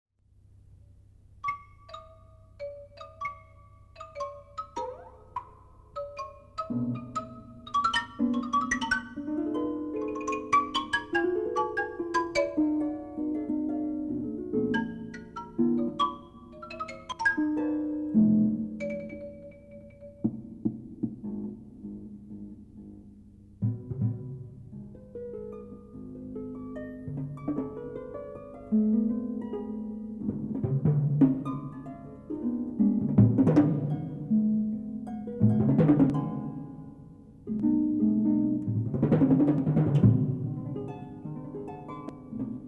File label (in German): duo harfe und schlagzeug